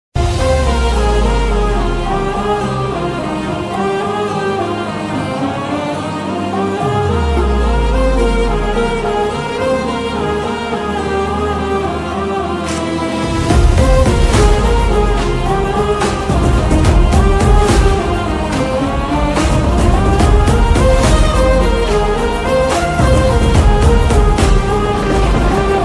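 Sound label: background music ringtones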